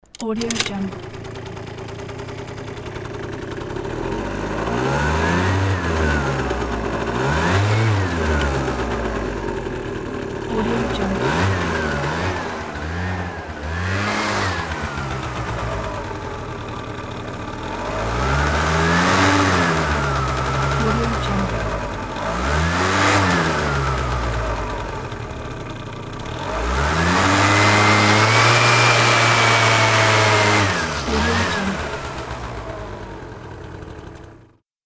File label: motorcycle starts and accelerate; dropsoundproduction